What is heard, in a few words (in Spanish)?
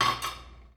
Platos